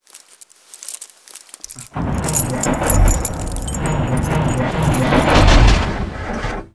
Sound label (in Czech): klice; Mafie 1